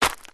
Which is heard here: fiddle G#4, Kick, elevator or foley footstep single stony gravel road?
foley footstep single stony gravel road